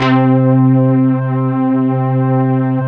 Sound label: SYN, Analog; Sound & Vision; Gigapack I CD 2